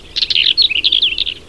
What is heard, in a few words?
Oenanthe hispanica